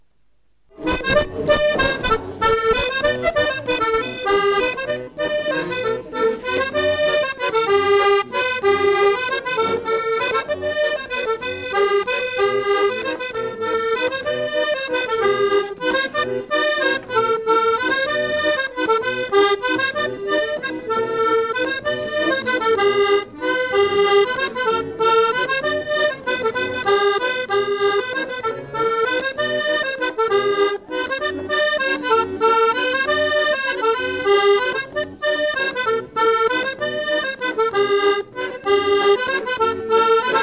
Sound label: mazurka